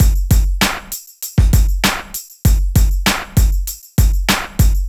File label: Packet, downtempo beats